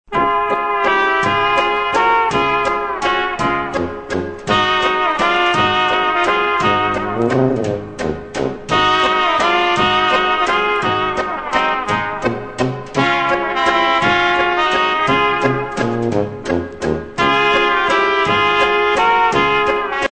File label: waltz